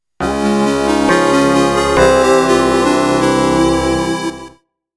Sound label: Item get